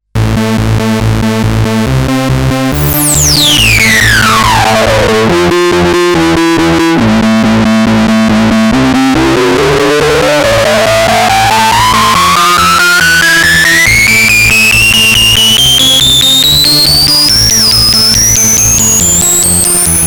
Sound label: Roland MC-202 Feed